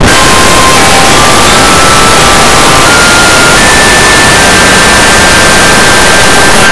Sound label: music bx